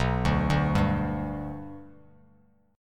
B Minor Sharp 5th B Bass Standard, chord; arpeggiated; JGuitar

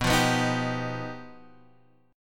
G Augmented 7th

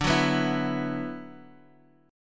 D 6th Add 9th D; D6add9 strummed; JGuitar

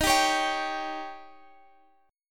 C sharp Suspended 2nd Flat 5th D sharp

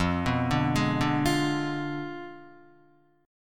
F Diminished 7th F down Guitar; Fdim7 arpeggiated; JGuitar

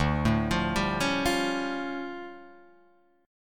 D Minor Major 11th, down, Guitar, Open G

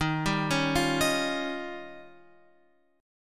D sharp 9th D sharp, guitar

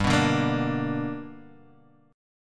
B Minor Major 7th Sharp 5th

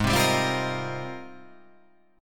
G sharp Major 13th

